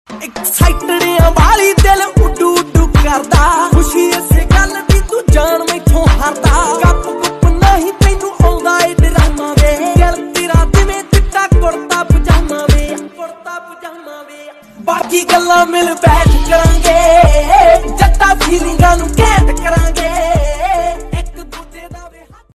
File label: Desi Punjabi Ringtone